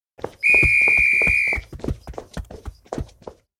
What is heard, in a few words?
police; prolog